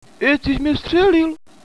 hostage death; cstrike

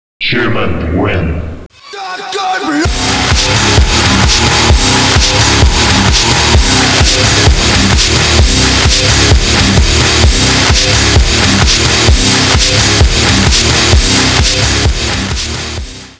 human win; cstrike

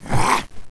miss; cstrike

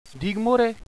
startle, hostage reactions

